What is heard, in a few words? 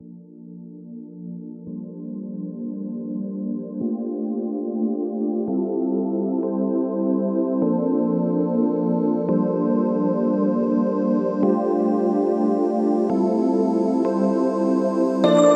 Minimal Electro